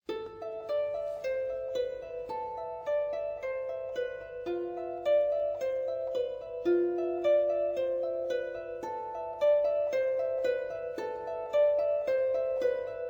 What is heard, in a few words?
Harp